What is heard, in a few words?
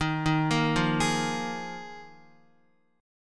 Eb Suspended 2nd Suspended 4th, Guitar; Ebsus2sus4 arpeggiated; JGuitar